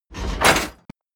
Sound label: chest metal small close